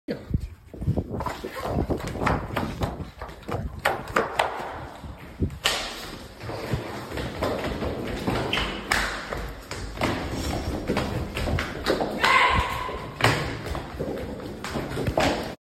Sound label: ndheheh